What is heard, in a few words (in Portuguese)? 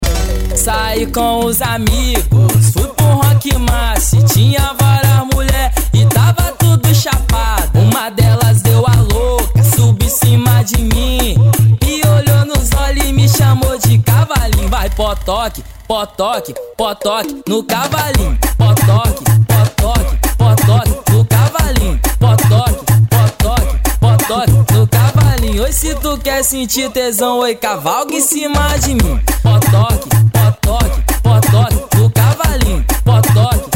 funk bass